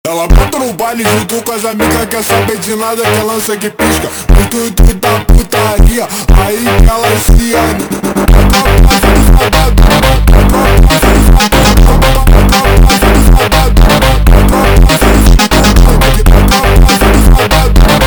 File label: Montagem slowed